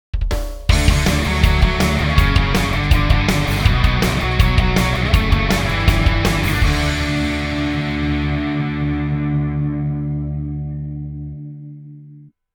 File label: Rock Intro